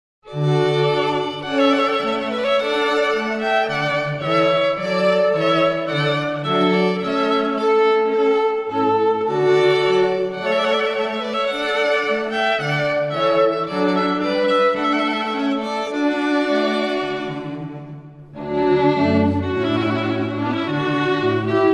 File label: Trumpet Voluntary; Clarkes Trumpet Voluntary